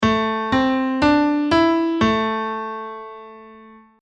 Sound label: Minor Key; Quiz